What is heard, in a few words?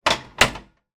household grill style close; george foreman style; zapsplat